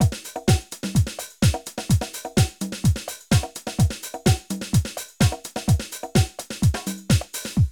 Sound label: drum loops, House & Garage